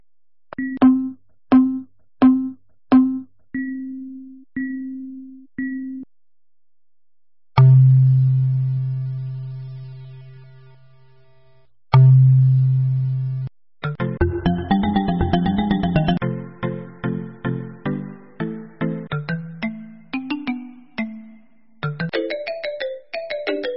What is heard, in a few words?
Marimba